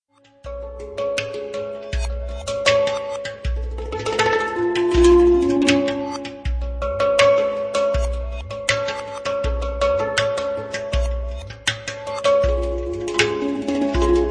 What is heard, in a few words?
agogo